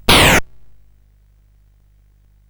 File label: sid CSG8580R5; hvsc